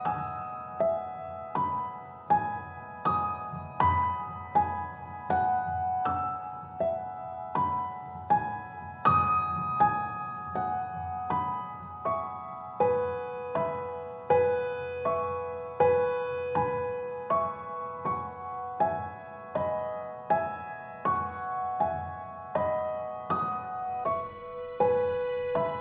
Reduced Piano Reduced Arrangement

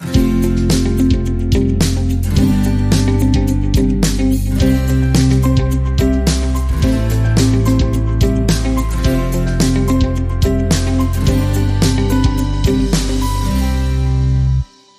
Acoustic Inspiration

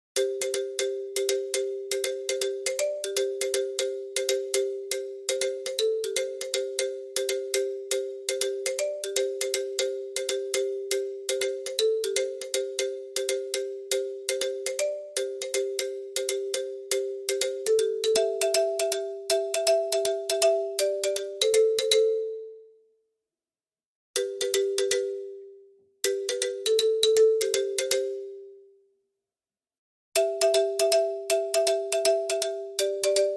Clicky Marimba

